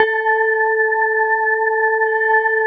GLASS KO; Synclavier Sampler Library; KEYS AND GUITARS

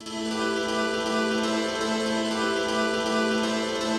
FX Piano (120BPM), retro house

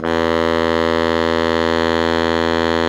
BARI MF E 1, Sax; Giga Samples Collection; BARI QUICK

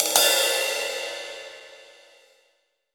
Crash Phrase, Roland BK-5 Cymbals

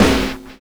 Extract Snare; Sonic Foundry (Sony Creative Software); Drums & Drones; Construction Kits

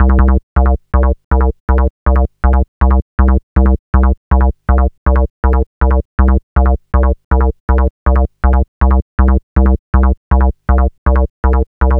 gro 160, Hardcore Loops, Bass Loops; Dance Synths

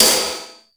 MONDO PERC, METALIC DRM

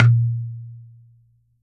coconut; Unique Instr

